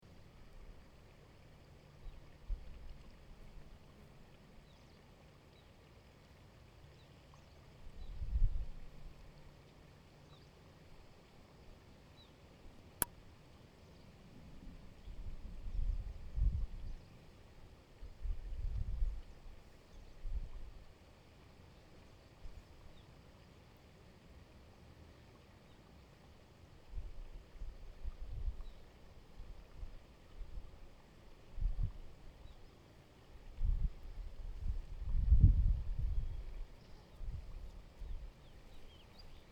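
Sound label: amb nature, Inde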